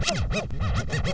scratch

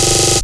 beep

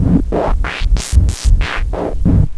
LFO

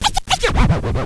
scratch

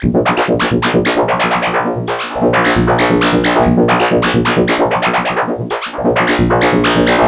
Ring Lang

